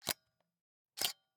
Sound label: Camera, Pentax KS2, DSLR, electronic, mechanical, snapshot, exposure hold, release; Eiravaein Works; Vaeyan IV